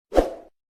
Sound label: woosh sound effect